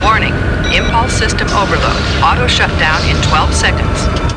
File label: impulse